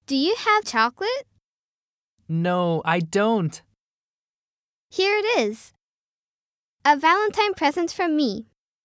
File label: chants